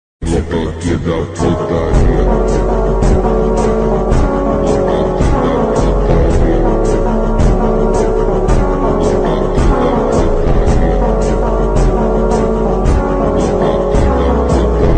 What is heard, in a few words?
slowed reverb ringtone, attitude bgm